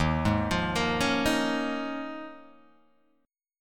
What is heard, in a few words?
Eb 7th Suspended 2nd Suspended 4th Eb, Guitar Open G